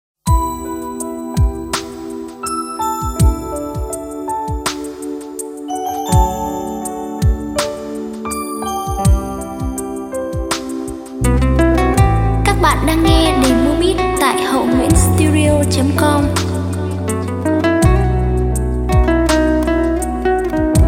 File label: beat, playback